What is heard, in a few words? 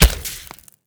egg Hit; Crawler broodmother